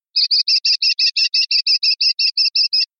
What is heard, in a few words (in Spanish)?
gavilanada, pardillo